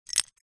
lego bricks x4 set down

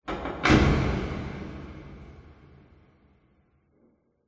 industrial door metal close very large reverb; zapsplat

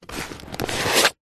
household book telephone directory pick up; zapsplat

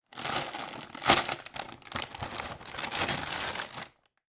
cereal packet open; food

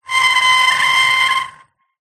plastic small item scrape screech metal; foley

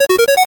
arcade game tone

